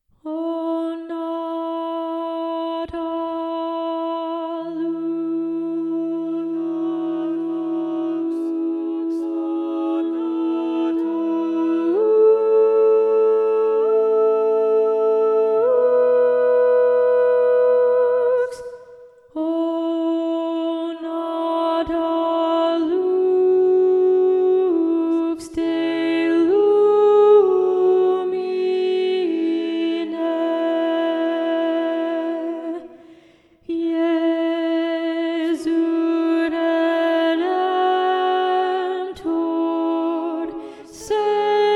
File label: Alto; O Nata Lux; Practice Room